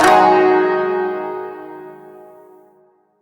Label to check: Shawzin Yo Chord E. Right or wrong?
Right